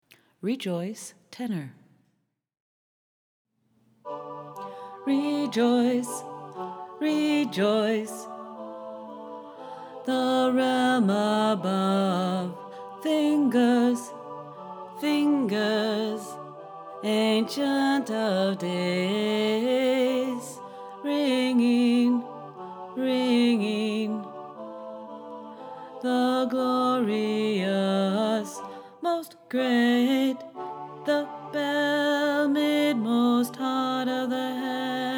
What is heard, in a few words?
tenor